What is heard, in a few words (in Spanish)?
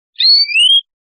quejas pui, verderon